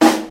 bassy snare sample d sharp key, acoustic snare tuned to the D# note; snare drum samples; one shots